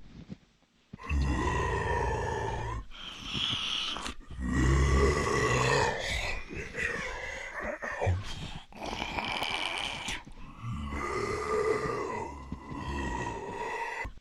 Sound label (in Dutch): zombie rustig